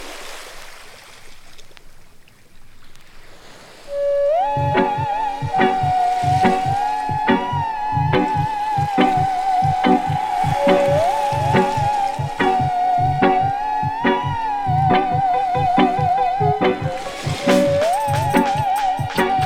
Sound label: theremin